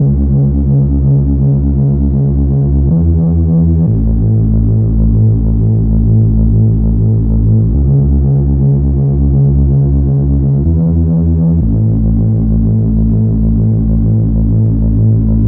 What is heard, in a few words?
detroit techno loop